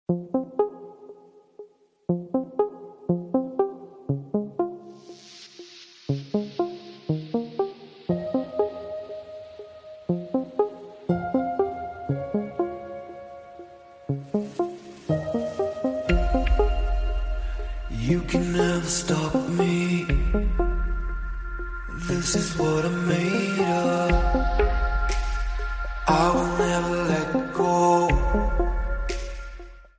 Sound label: volvo s90 ringtone